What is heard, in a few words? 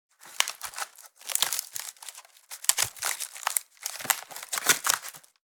Wicker basket creaking